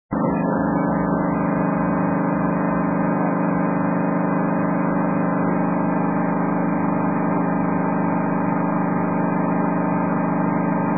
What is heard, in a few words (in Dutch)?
ketel